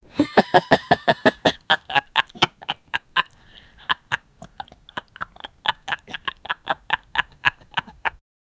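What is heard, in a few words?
happy family eating laugh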